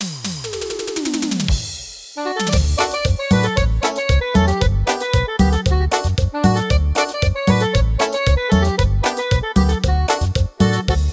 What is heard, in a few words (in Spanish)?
Cumbia Sureña